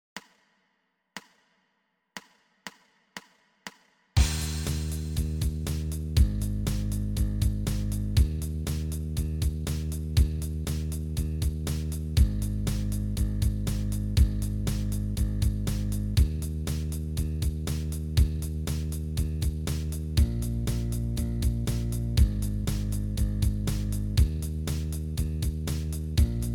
bass startup eb blues 120

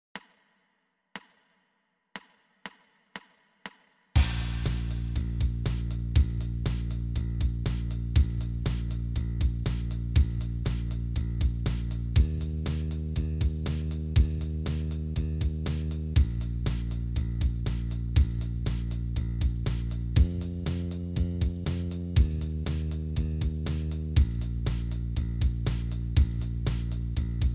bass startup bb blues 112

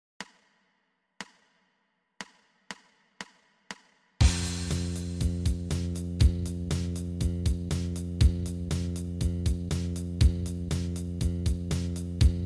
bass startup f 120

